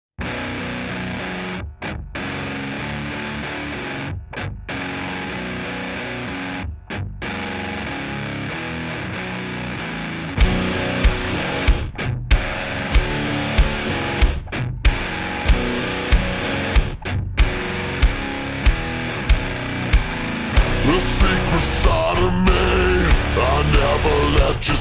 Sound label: slowed reverb